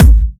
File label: short kick drum sound g sharp key; kick drum samples